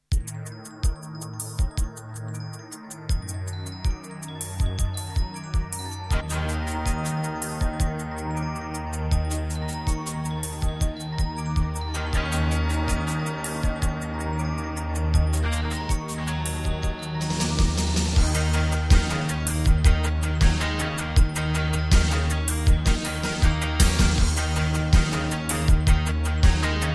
Midiplus MiniEngine USB; Battle Isle II

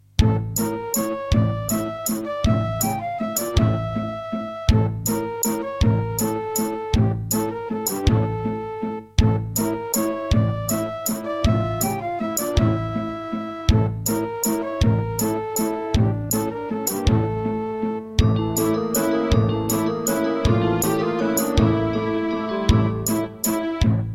Aztech Sound Galaxy Wave Power

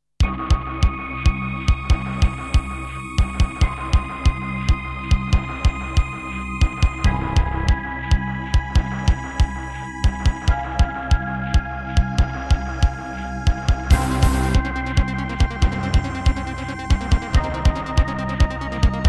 Roland SC-7; sigil doom episode 5

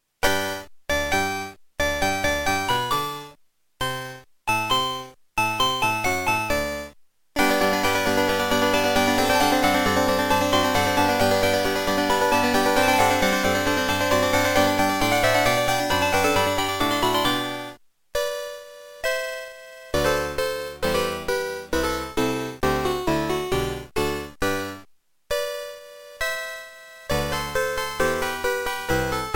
Eine Kleine Nachtmusik, Beepblaster BEEPMODE; Beepblaster 2.0; classical; Mozart; Eine Kleine Nachtmusik partial

